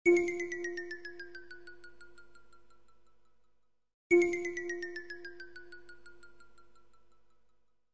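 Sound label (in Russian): перезвон появления феи; Для феи; Перезвон колокольчиков создаёт волшебные звуки; Взмах волшебной палочки, сказочные звуки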